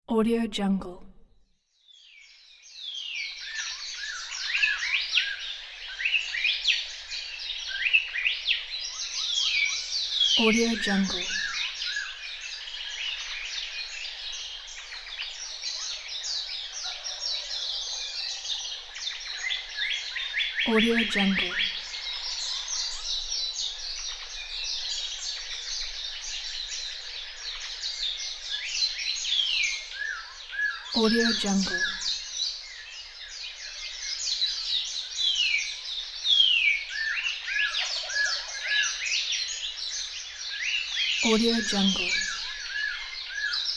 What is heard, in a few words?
birds